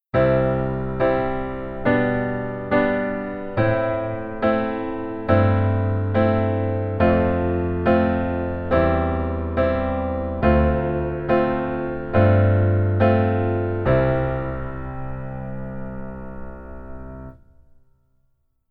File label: Keyboard Chord